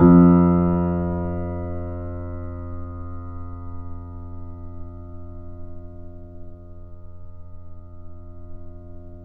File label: PP F 0, Grand Piano off mic; AKAI S6000 CD-ROM - Volume 2